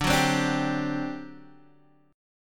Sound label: D 11th D, down, Guitar, Half Step Down